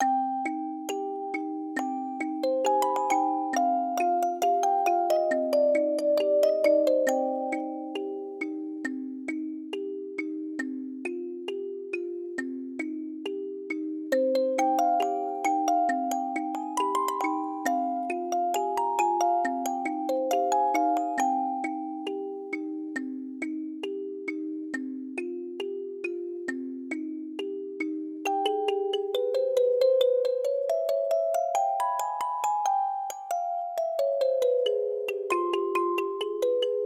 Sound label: Oasis (Music Box)